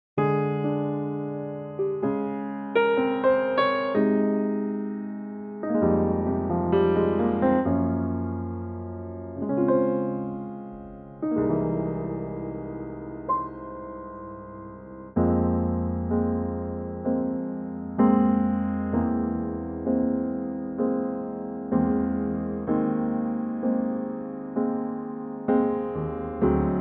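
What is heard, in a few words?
F minor Piano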